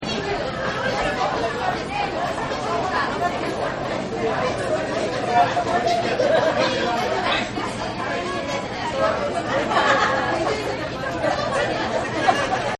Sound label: Tourist Boat, Crowds; Crowd Sound Effects